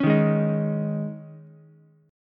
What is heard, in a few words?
Eb 7th Suspended 4th Eb, Ukulele; strummed; chord